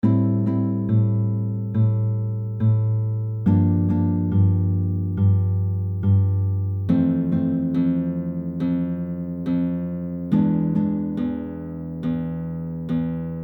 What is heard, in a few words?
Slow, fingerpicking